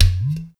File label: Perc Udu Low